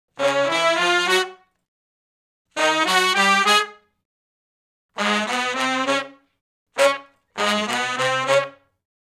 R B Riff IV, V, I (C#) unison, Horncraft for R&B, Sections; Sonic Foundry (Sony Creative Software)